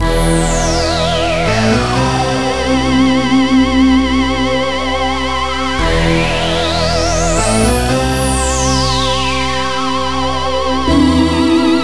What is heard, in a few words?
Possessed Accordion Intro